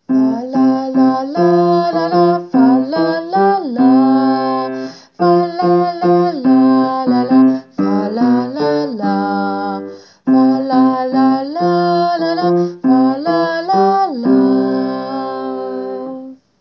Fa-lala bass

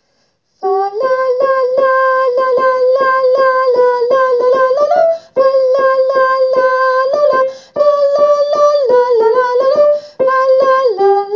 Fa lala sop

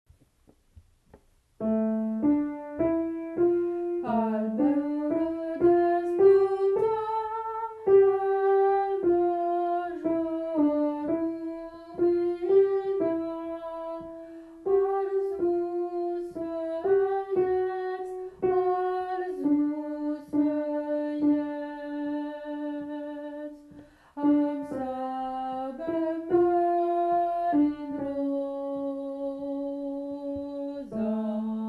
el cant alto

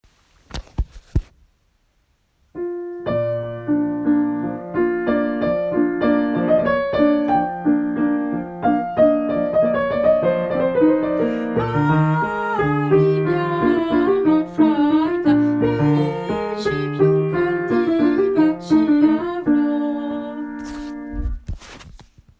Libiamo Alto Basse; Brindisi (Verdi)

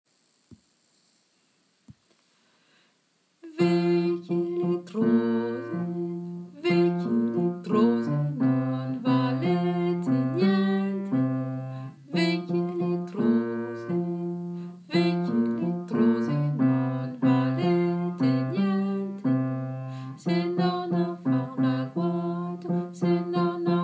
vecchie basse; vecchie letrose

